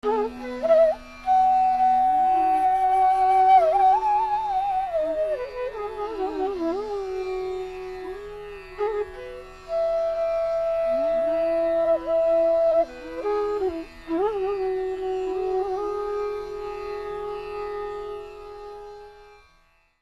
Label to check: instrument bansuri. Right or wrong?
Right